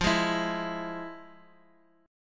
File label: F Minor Major 7th, chord